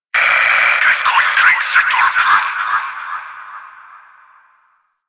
Fortress Exterior Notification; Ambience; Warframe; Grineer Fortress

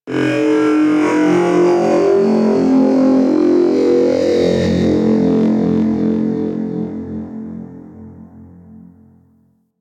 Temple Chant Texture; Warframe; Corpus Ship

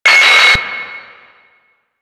Screen Flicker; Warframe; Duviri; Easter Eggs

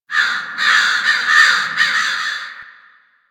Fall Crows; Warframe; Ambience; Nineteen Ninety Nine; DE

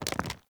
Foley Movement; Characters; Enemy; Mockeries

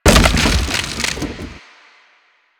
Wood Bench Destroy; Ambience; Props; Warframe; Nineteen Ninety Nine

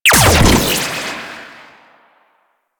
Shock Rifle Primary Shot, weapons; Warframe; Corpus

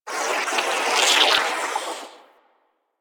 Venus Landscape Puffer Plants, Ambience; Props; Warframe; Venus Open World

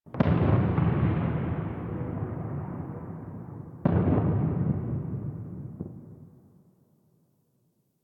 Ambient Distant Explosions; Ambience; Warframe; Nineteen Ninety Nine

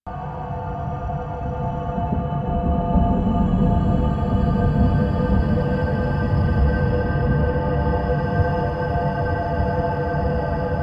Amphitheatre Pre Show Loop; Warframe; Duviri

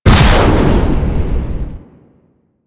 Jetpack Melee Launch Up; Warframe; Veilbreaker; Sprag

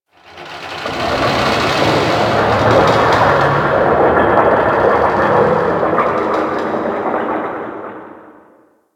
Ocean Pressure Creak; Ambience; Warframe; Grineer Ocean

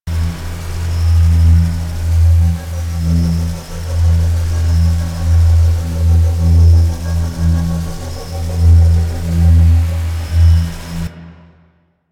Guardian Shell Invulnerable Loop; Warframe; Focus; Vazarin

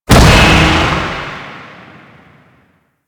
Reckoning Explosion; Warframe; Warframes; Paladin

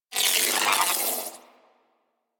Ball Held Spark; Warframe; Missions; Tenno Ball

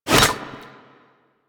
Infested Fishing Spear Line Retract Catch; Warframe

